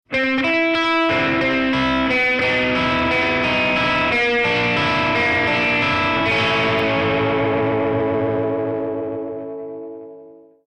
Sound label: ringtone